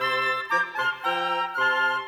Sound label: Winds, Orchestral; Sonic Foundry (Sony Creative Software); Orchestral VOL-2; Modern 31